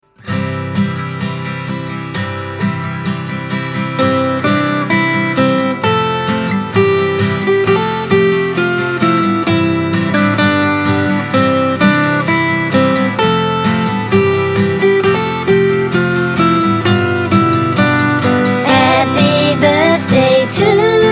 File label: Birthday music